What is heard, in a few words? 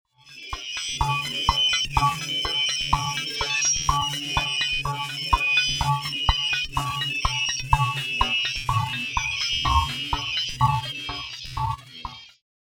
Droid Deconstruction Chamber, robot sound effects; Andrew Fleming